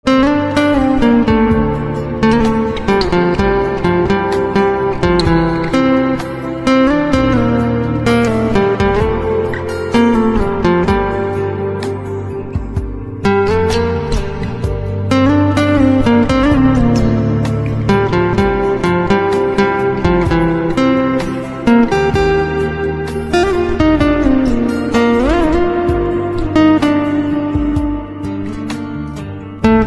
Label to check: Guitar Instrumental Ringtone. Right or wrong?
Right